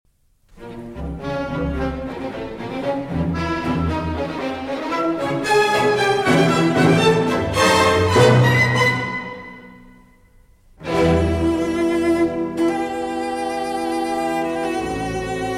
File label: Cello Concerto in E Minor, Allegro - Moderato - Allegro Ma Non Troppo; Elgar; Op. 85